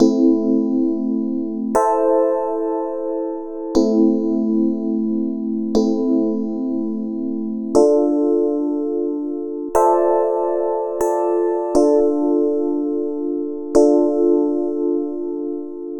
Electric Piano; Sonic Foundry (Sony Creative Software); Club Joints and Street Anthems; Ruff Side

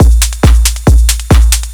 drum-loops, bass-department, techno, 138; Techno ID

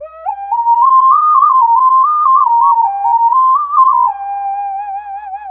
Double Occarina

